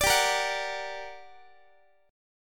G Minor Major 7th, down, Guitar; GmM7 strummed; JGuitar

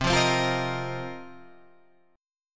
A sharp Suspended 2nd Suspended 4th C

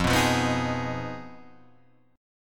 F Minor 7th Flat 5th F down Guitar Half Step Down

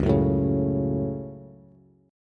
Db Suspended 4th Sharp 5th, Bass; strummed

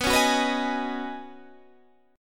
C sharp 9th Suspended 4th B, chord

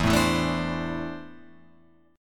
E 7th Suspended 4th Sharp 5th, Guitar, Open F; E7sus4#5 strummed; JGuitar

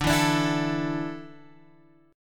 D Suspended 2nd D down Guitar Open G

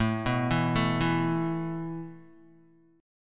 A Suspended 2nd down Guitar; Asus2 arpeggiated

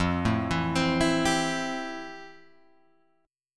F Major 9th F, Guitar, Open G

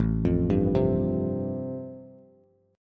A-Minor 7th Sharp 5th, Bass, chord; 5,8,5,5; Am7#5 arpeggiated; JGuitar

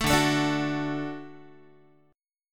D Major D down Guitar; 12,10,12,12,10,x

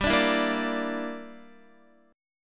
G Minor 6th, Guitar; Gm6 strummed; x,10,12,9,10,9

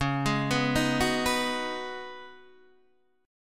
Db 9th Suspended 4th Db Guitar Open D

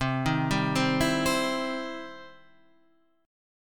E Minor Major 7th Sharp 5th C

